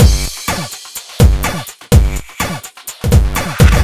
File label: 125 Fill, Drum Loops; Beatdown 1984; 125bpm; Nu Dance Electro